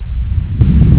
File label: booster